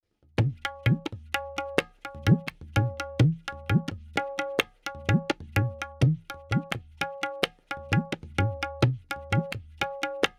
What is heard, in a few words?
Tabla C 4-4 128, Percussion